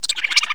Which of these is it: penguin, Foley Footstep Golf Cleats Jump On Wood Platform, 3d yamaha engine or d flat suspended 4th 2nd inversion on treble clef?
penguin